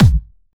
Kick, Drums; Bonus Drums

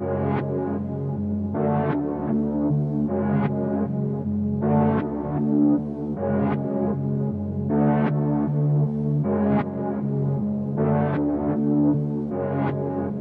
Synth, 156 BPM F# Min; Cymatics; 2022 Melody USB Expansion; Tunnel Vision

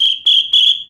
whistle, Cartoon Film Effects

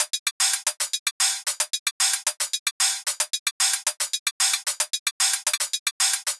Hi-Hat Loop 150; Dubstep

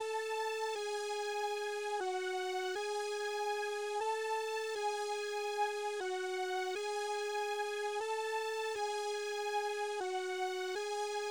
120 BPM C# Min Lead, 1980s; Cymatics; GHOST (Beta Pack); Arcade

